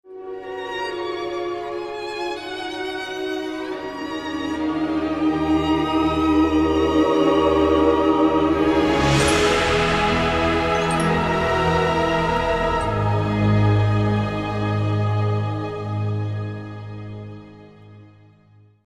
抒情的背景音乐

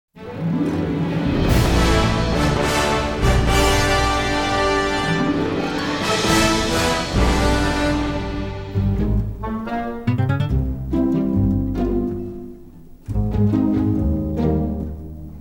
电影音乐; 奥斯卡电影音乐

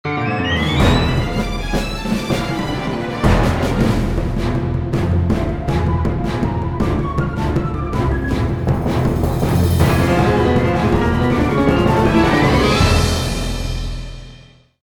大气的背景音乐

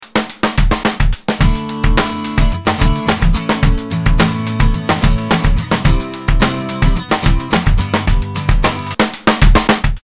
电视包装音乐

